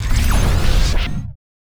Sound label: WAR POWER UP; NECROMETA; Mob